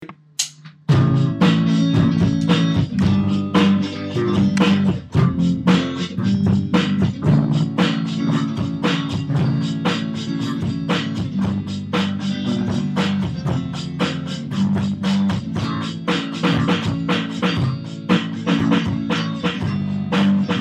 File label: syncopation piece